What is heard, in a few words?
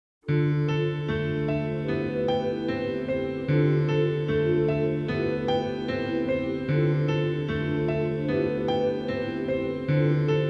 off strings ver.; Fate Stay Night Soundtrack Collection; Fate Stay Night Original Soundtracks